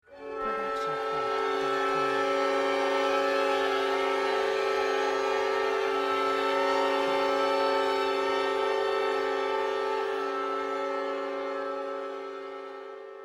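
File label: woodwind cluster string bend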